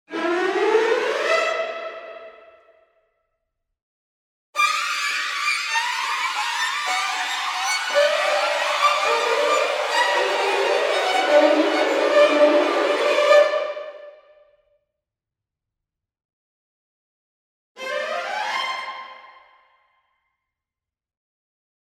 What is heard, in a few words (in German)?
Streicher Runs; Albion One